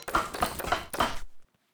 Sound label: crafting smol; UI; Illusion UE5